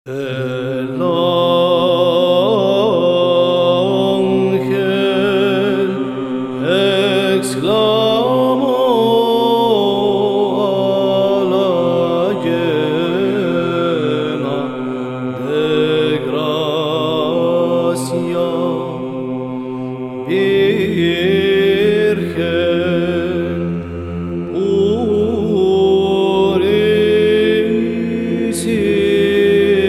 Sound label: Spanish Tone 1; The Angel Cried